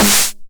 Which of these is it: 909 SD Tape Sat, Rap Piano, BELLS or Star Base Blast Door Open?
909 SD Tape Sat